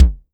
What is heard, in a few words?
SIMMONS SD-5 drums